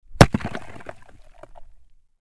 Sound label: rock; cstrike